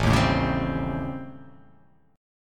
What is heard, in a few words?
A 7th Sharp 9th Flat 5th; x,0,1,2,1,2,2,x